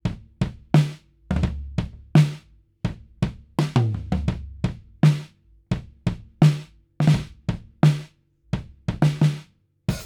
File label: BLUMLINE OVERHEAD